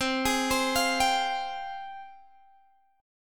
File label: Ab Major 13th C